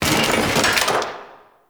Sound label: Chair Destroy Misc; Warframe; Entrati; Ambience